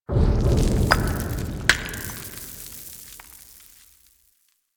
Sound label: Town Stone Debris; Ambience; Warframe; Duviri